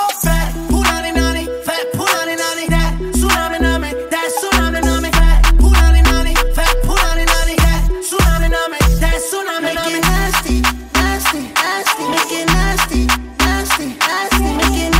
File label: Ringtone